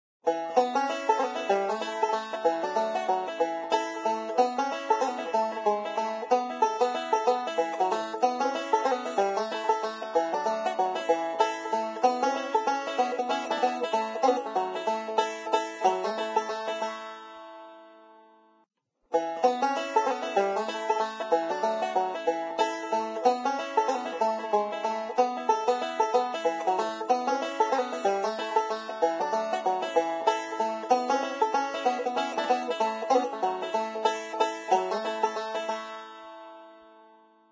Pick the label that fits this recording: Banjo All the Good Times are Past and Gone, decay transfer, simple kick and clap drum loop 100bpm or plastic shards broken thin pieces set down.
Banjo All the Good Times are Past and Gone